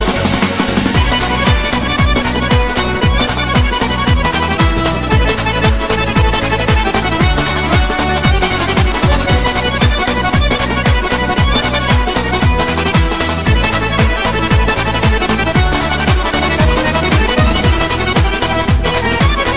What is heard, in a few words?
Lezginka; Asssa